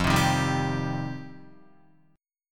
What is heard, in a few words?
F 7th Suspended 2nd Guitar, chord; F7sus2; strummed; JGuitar